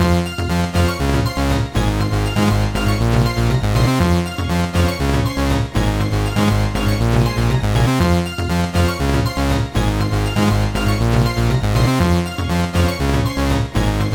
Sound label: Boss Battle Music